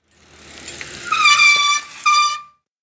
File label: bike stop screech; anemoi